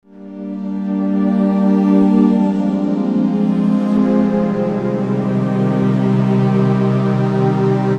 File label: String; Strings & Pads; Glade